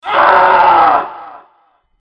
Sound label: scream